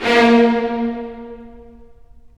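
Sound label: STR, strings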